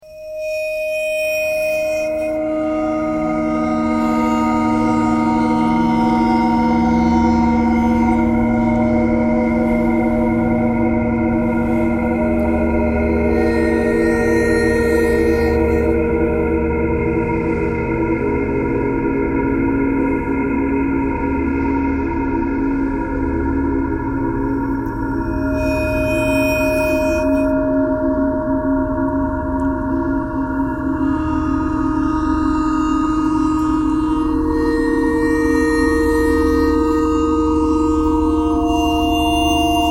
traffic kcore birds a ice bowed vibes thread simple; bowed metal